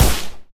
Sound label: aek971 silencer; STALZONE